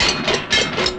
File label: Kid walk